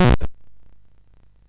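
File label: Petch, MOS 6581 SID chip music, games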